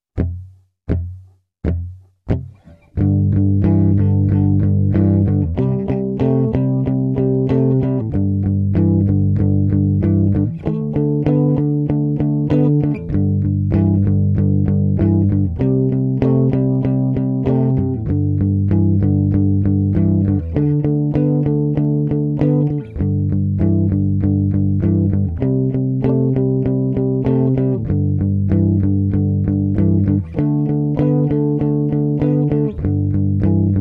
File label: 4 bar, Pentatonic; Each letter is equal to four beats; pentatonic fun